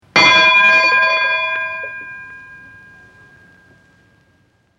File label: Bell Ship Strong Single Vintage